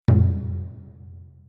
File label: Notestone; Glitch Hero